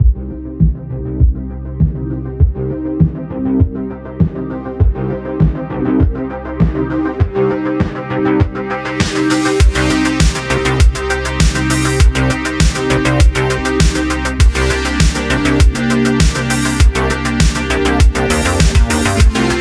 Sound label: remix instrumental